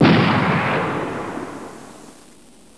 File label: RIFLE